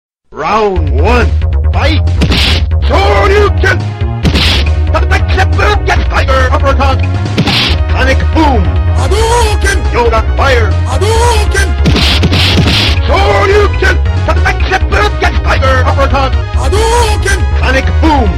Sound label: Street Fightr Sounds